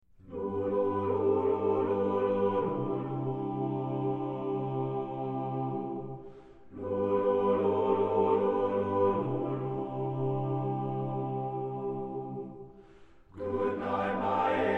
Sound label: ssattb